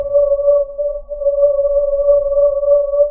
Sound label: alien; Percussion; HSS OP Pack; healing soundscapes